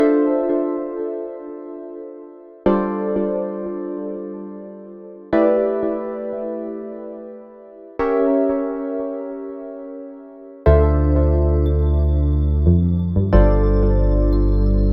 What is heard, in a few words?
Jazzy Beat BGM